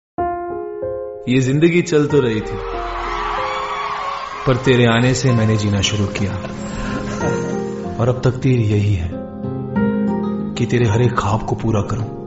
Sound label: bollywood movie songs; ashiqui 2